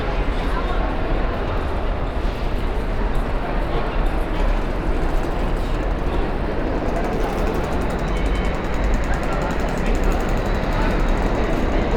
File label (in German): rauschen draussen, Brasilien